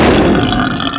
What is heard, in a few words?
Beep; The Mask